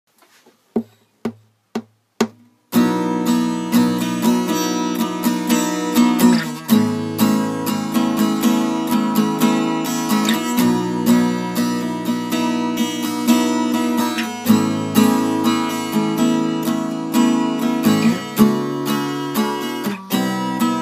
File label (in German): open string voicings beispiel dur typ